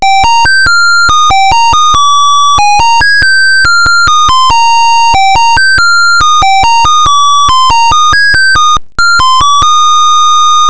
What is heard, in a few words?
rock a bye baby, melody